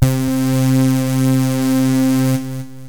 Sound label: syn, loops synth